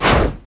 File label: basicattack human; LoL; shyvana